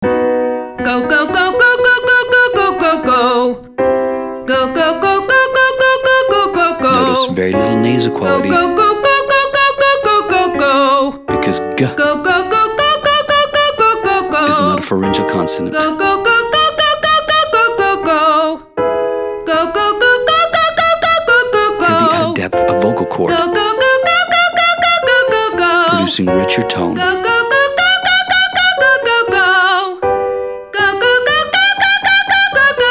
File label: Exercise go go females; Singing